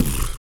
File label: SLOBBER; Spectrasonics; Planet